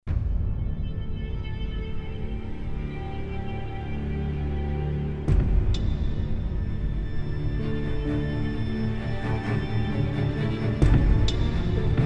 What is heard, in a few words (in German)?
Kinotrailer; Zwilling